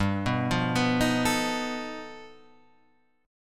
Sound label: G Augmented 9th, Guitar, Standard; G+9 arpeggiated; JGuitar